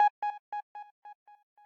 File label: arp